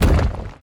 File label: self ball bounce; dung defender